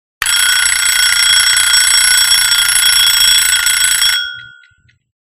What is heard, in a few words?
Traveling Alarm Cloc; clock chimes rings